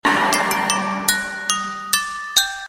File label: Dollhouse Chimes Sms